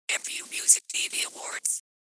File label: whisper